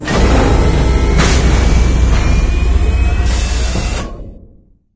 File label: advisor leave, ambient; source engine; episodic